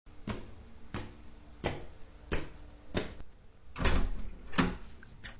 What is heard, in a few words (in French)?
pas montee